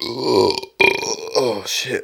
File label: burp